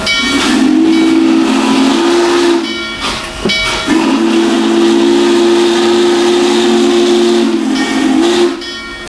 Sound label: steam